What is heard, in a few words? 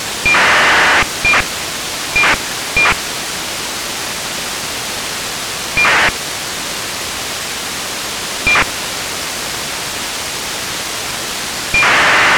ACARS sound